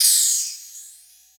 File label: Hat Open; Closed Hats